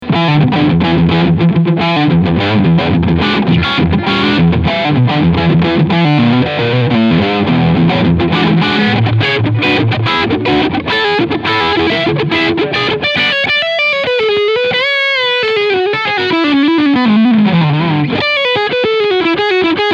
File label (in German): Cort X-700 Duality E-Gitarre Crunchsound Position 4